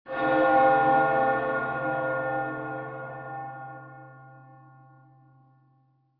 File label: church bell